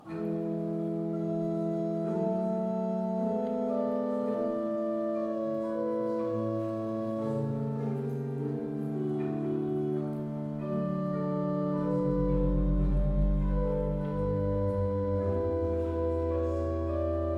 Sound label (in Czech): Gamba 8; Vsemina